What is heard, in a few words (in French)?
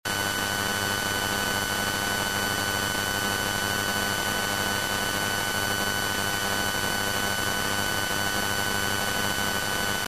telephonie UMTS 3G